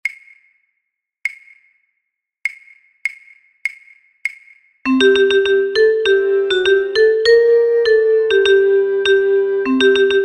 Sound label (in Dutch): fluit